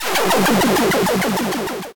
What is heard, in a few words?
game start